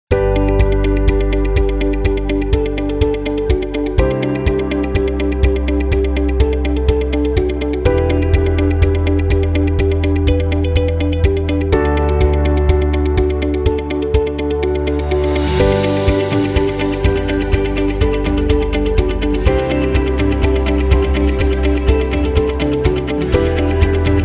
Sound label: Positive Epic Corporate Indie, corporate music; corporate music vol 15